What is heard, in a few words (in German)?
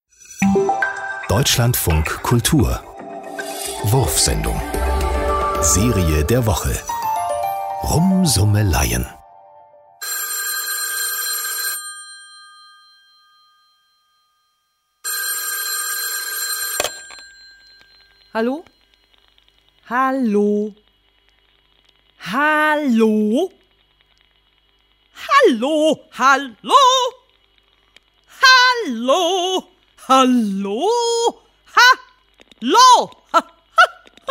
rumsummeleien am telefon; wurfsendung